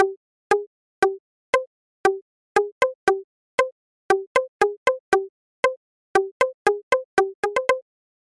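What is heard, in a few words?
Hip Hop Pizzicato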